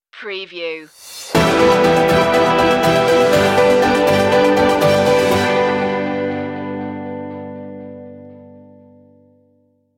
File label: optimista stinger; corporate motivational